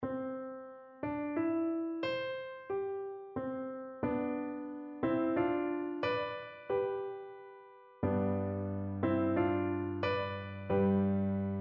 pongauer jodler, piano

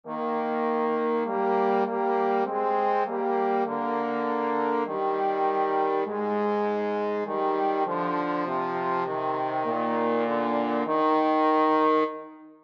lo how a rose, trombone duet

